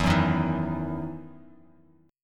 E Major 7th Suspended 2nd E down Bass Standard; strummed; JGuitar

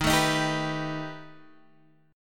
B Augmented 7th D sharp

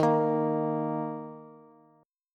Eb 5th, Bass; Eb5 strummed; x,x,13,15; JGuitar

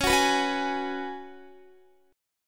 C sharp 7th Suspended 4th C sharp, Guitar; C#7sus4; strummed; tuning=4F,4A,5C,5G,5C,6E; guitar chord

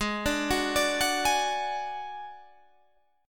F sharp Suspended 2nd Sharp 5th

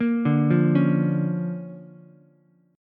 D-Minor Major 7th Sharp 5th-D-down-Ukulele; DmM7#5 arpeggiated; JGuitar

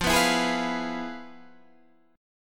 F Minor Major 7th Flat 5th Guitar Open D

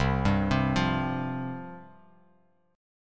C Suspended 4th, chord, Bass Standard; Csus4 arpeggiated; 8,10,10,10; JGuitar

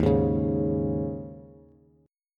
D sharp 7th Suspended 4th, Bass; D#7sus4 strummed; JGuitar

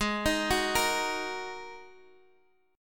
G sharp 7th Suspended 2nd G sharp Guitar Drop D

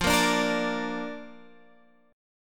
D Minor Sharp 5th F

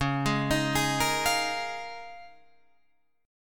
Db 6th Add 9th Db, down, Guitar Drop D

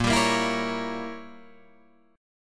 Bb Diminished 7th Bb Guitar Drop D; strummed; JGuitar

